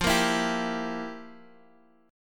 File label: F Major 7th Suspended 2nd Suspended 4th, F, down, Guitar, DADGAD